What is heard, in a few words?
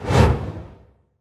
Demonic blast Travel; Hero Oloch